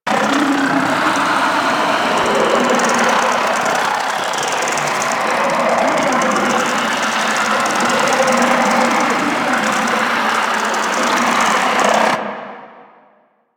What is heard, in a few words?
Devour Capture Loop; Warframe; Mummy